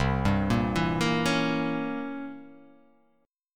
C 7th Flat 9th C; C7b9 arpeggiated; JGuitar

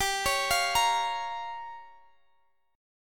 G Minor 7th Flat 5th, Guitar, Open D; chordshape; down

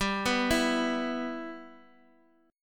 E 5th G, E5/G chord; arpeggiated; JGuitar

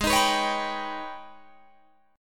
Ab Minor Major 11th down Guitar Drop D; strummed; AbmM11

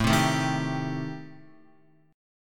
A-Minor 7th Flat 5th, Guitar, chord

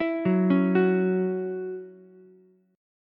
C sharp Minor Double Flat 5th C sharp Ukulele; C#mbb5; arpeggiated; JGuitar

